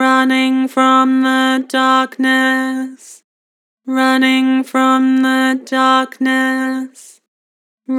Vocals, Flat, Running from the darkness; Harmor

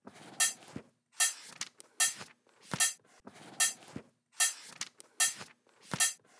Dubstep Drum Loop Cymbals, 150bpm; Stem Seperated

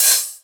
Open Hi Hat, Dubstep Drum Shots; Ghosthack; Demolition; Infernal

